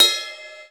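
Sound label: techno ride; USB Soundscan vol.11; Drums Dance & Groove; AKAI; TEKNO KIT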